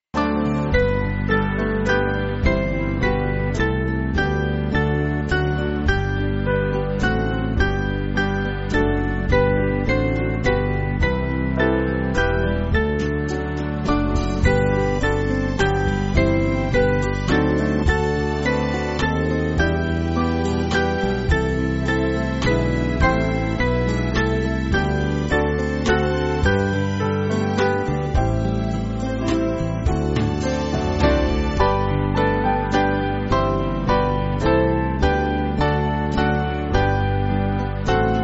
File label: Jesus Thou Joy, band; St. Luke